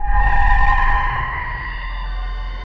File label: layered robotic chuckles, horror game